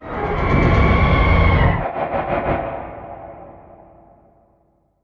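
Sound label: reverse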